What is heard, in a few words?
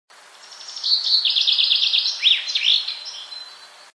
finch